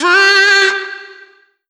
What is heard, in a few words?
Omnivox oneshot G, Vocal; One Shots; Slate Digital Omnivox Vocal Sample Pack